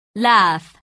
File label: laugh